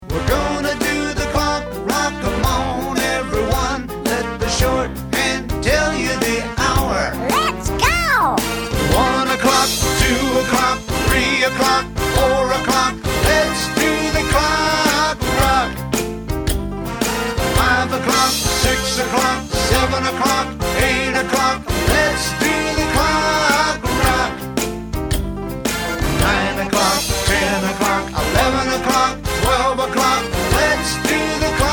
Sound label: clock rock